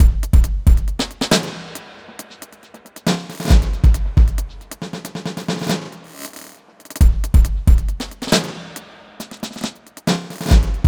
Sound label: drum loop, drum n bass